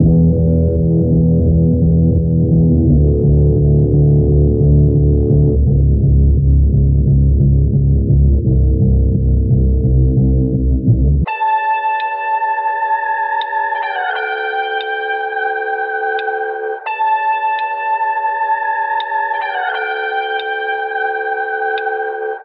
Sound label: keys, sad loops